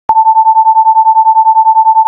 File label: sin 880 890, tremolo